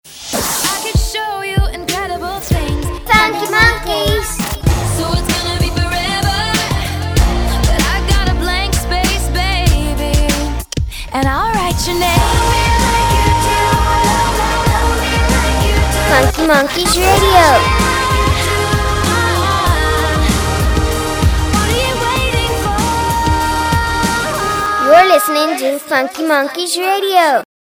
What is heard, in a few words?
Radio Music ID; Funky Monkeys Radio